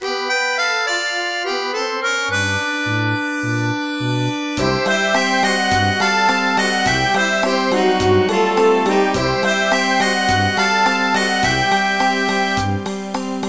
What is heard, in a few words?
Underwater Remix; Super Mario Bros 3